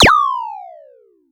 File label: FX Ring; One Shots; Drums; Home Run; FX Hit; Ableton Live 12 Lite; Core Library